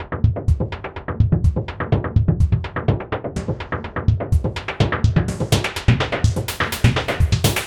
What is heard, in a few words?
125 drum loop